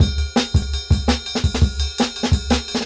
168, Jungle Breaks; Warp; Original Jungle Breaks

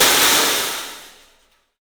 CYFX, EFFECT CYMB; 3D Sound; 3D Drums

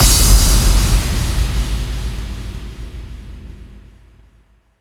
FX Kicks

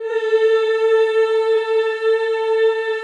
CHO Yhh, Roland

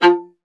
viola F; Miroslav Vitous; Solo Instruments VOL-1

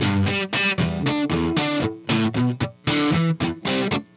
Riff Guitar, Indie Rock

